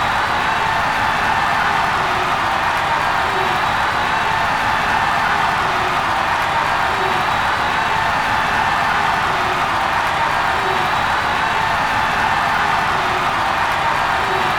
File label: BBall Crowd Cheer Loop; SFX Kit; Crowds